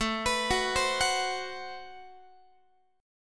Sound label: A Minor 6th Add 9th A Guitar; arpeggiated; Am6add9